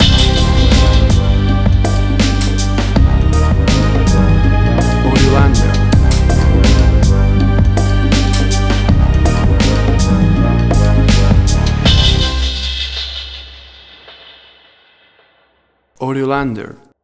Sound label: Timid Orchestral Hip Hop